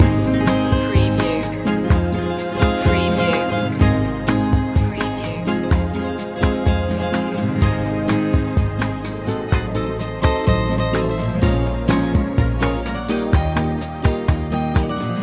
calypso night loop

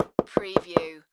Door Knock Solid

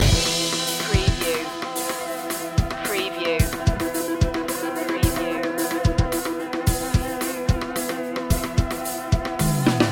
guitar fusion loop

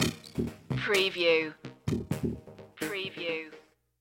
iron move stinger

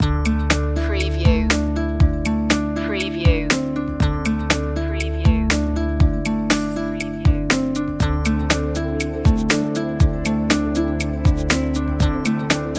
floating guitars, chill

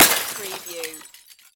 Crash Glass Smash

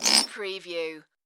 Tui Croak; Bird sound effects including birds chirping and squawking

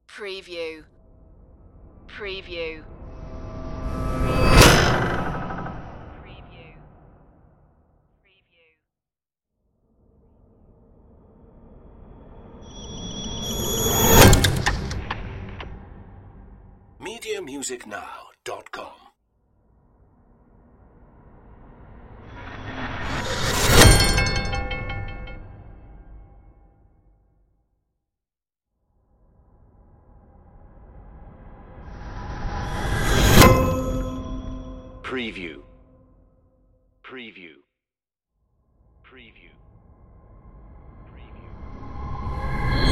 weird whoosh impacts

